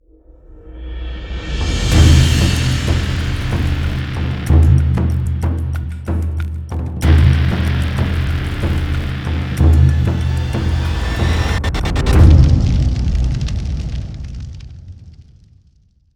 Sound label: Cinematic Logo Intro